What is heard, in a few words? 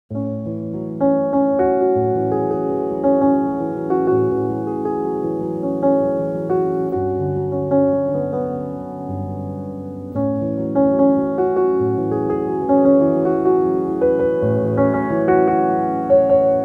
Piano; MWM Soundtrax Piano collection